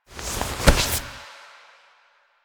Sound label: Hand Plant Foley; characters; generic foley; Warframe